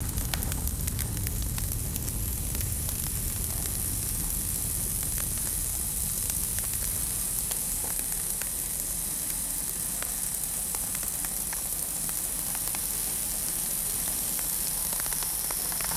small fire burning hay with light crackles

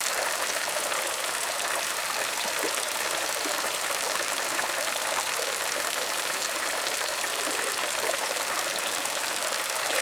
steady bubbling stream water flowing

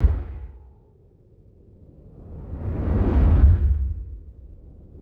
four low pitched fight whooshes; swooshes

